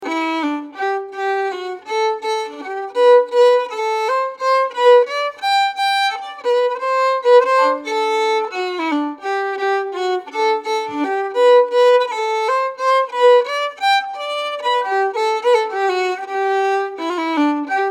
Waltz

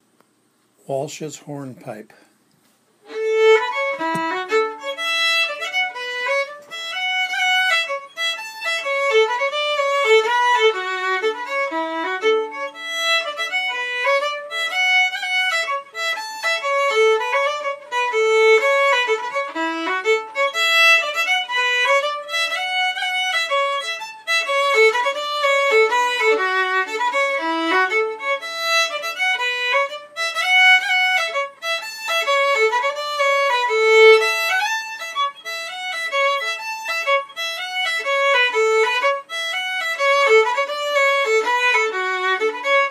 Hornpipe

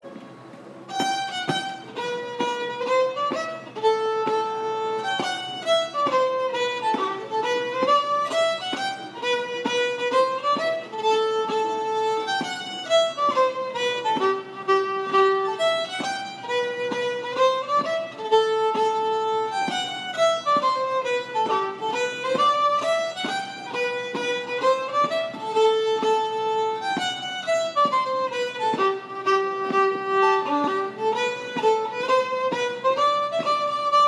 Shottische In G; Learned From Andrea Hoag

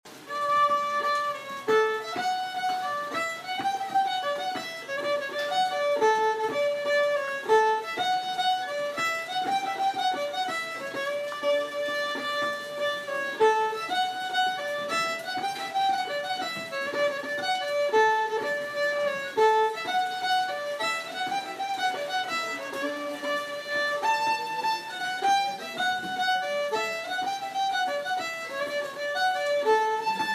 Polska In D From NW Dalarna

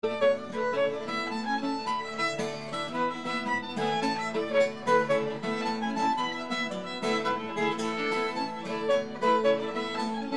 Irish Jig

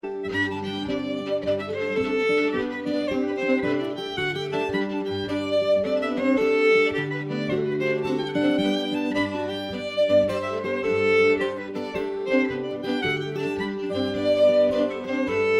Jig

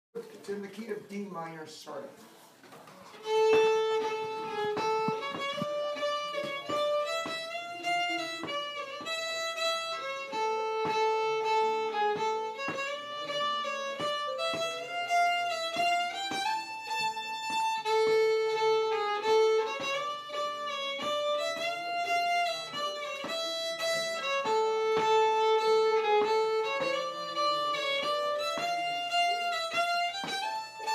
Polska From Mora In Dm

